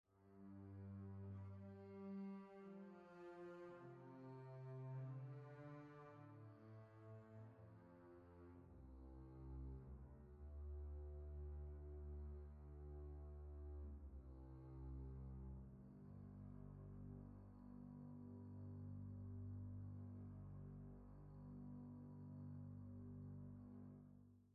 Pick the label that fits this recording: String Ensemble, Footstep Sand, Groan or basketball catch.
String Ensemble